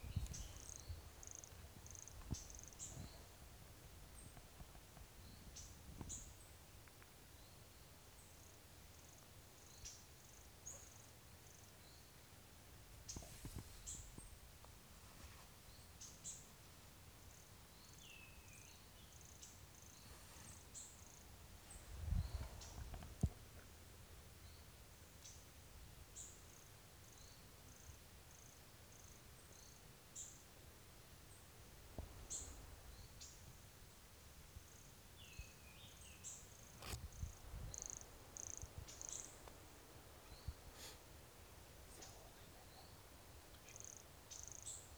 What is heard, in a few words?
Brachycephalus sulfuratus; Serra do Guarau; MRBornschein